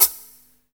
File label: SHAK, Hip Hop R n B; USB Soundscan vol.19; AKAI